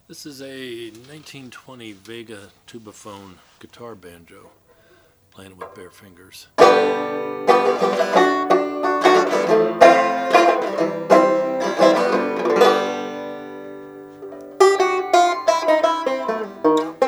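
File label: vega fairbanks tubaphone guitar banjo natural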